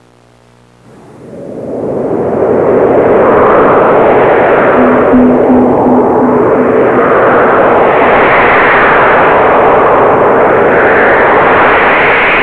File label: storm; Sound Library 2000; Wayzata Technology; Music In